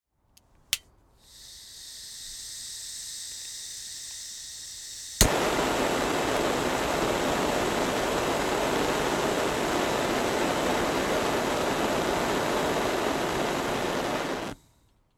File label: industrial gas blowtorch ignite blast off